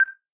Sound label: menu Swap; todee and todpee